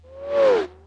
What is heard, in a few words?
fast car